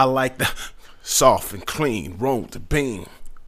Rap Vox

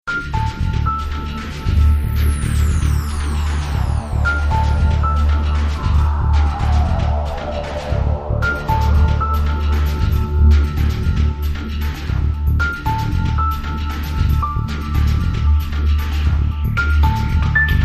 electric ambience

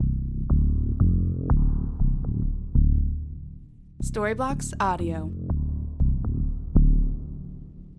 Ambient House Bass 120BPM C

